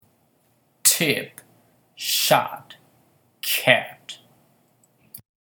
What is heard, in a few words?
hit